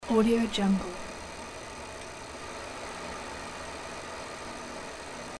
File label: bike wheel spinning loop; urban sounds